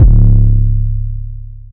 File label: 808 bottle D; Hip-Hop; Tonal; Slate; CAICOS Hip-Hop Sample Pack